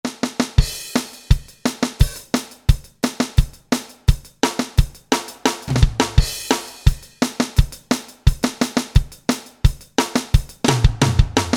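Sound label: Tight Rock 2 KIT, Roland SRX-01 Dynamic Drum Kits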